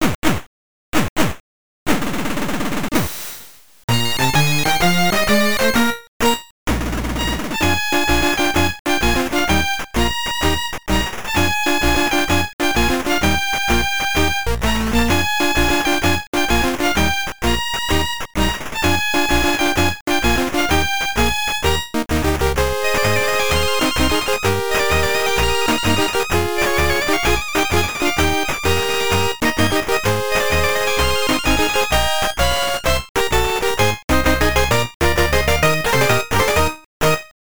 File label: March; galaga 88 tg 16